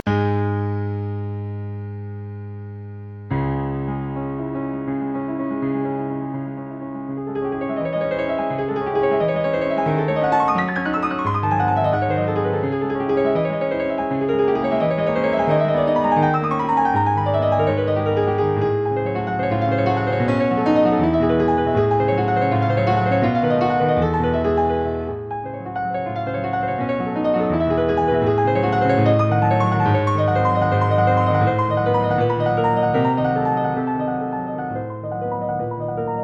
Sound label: Grand Piano